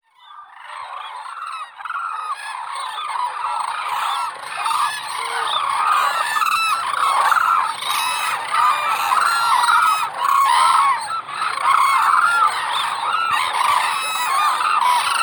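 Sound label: hooded crane, Grus monacha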